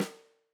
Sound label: Tam Snr, drum